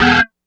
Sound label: Industrial Note Short, Keyboards